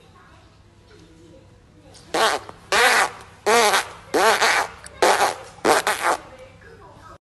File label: monkey blowing raspberries; audio meme